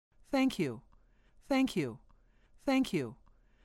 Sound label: jaw pop vocal track loop; sounds odd